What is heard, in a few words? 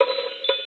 bell reverb, Digital FX; Crowdsourced Sample Pack